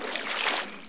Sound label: wade, player water